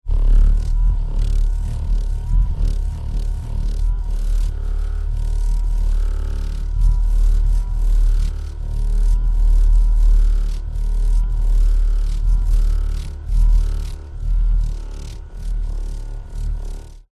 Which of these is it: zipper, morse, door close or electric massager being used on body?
electric massager being used on body